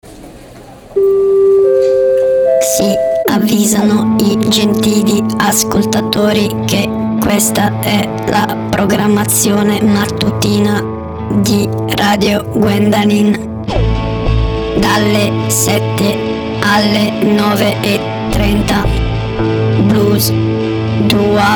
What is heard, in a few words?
Jingle; rotazione Mattutina